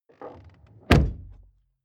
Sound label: car door close creak; Cars